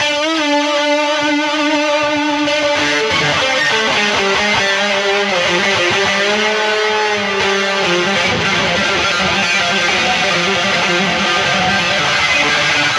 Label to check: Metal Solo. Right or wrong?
Right